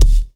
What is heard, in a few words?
KIT, Dance Mega Drums, HIP; Best Service